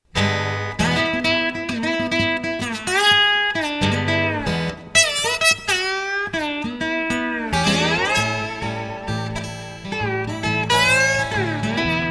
slide

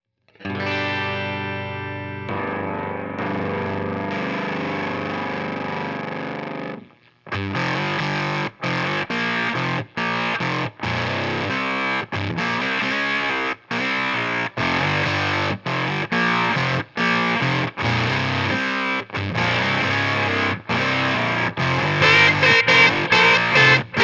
Disnorted

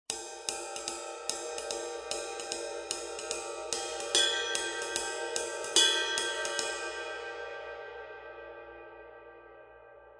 Raw Bell Dry Ride; Peformance

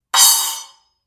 choke

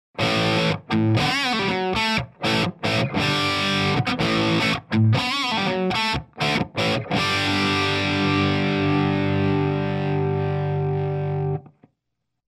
dirty

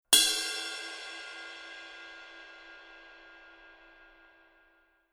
Bell

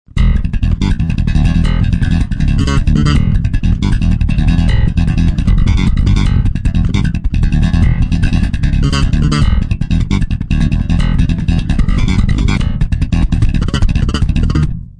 Slap Bass

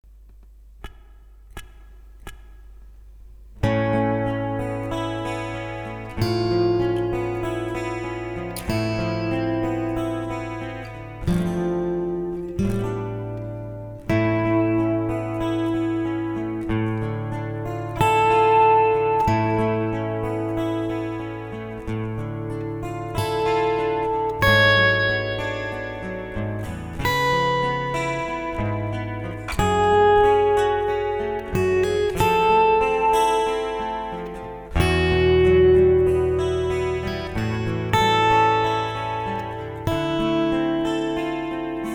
Ballad

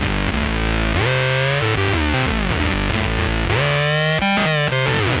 blue box

